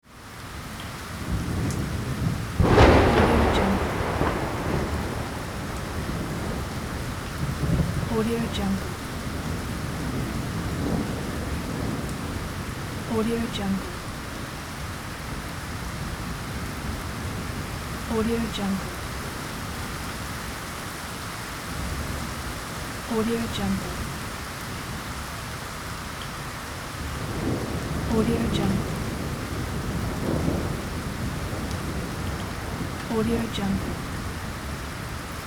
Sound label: rain with thunder